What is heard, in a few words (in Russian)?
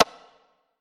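синтезированные ударные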